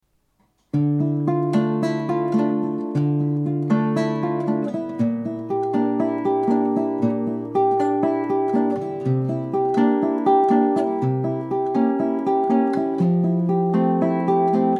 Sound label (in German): Milonga Wohnzimmeraufnahme mit DAT Rekorder ohne Soundeffekte ohne Klangbearbeitung, guitars